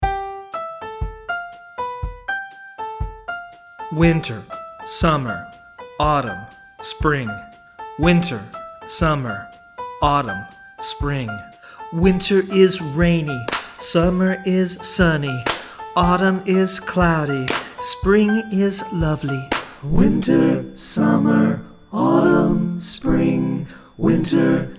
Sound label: seasons chant